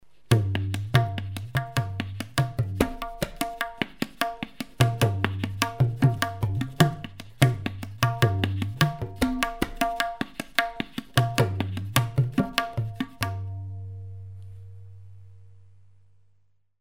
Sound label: Tabla solo